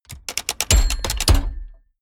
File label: mechanics medium rattle bell